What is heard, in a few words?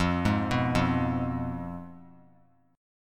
F Diminished; Fdim arpeggiated; JGuitar